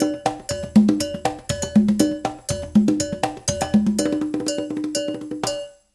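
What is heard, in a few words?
120 bpm, Classic Conga; Spectrasonics - Supreme Beats - World Dance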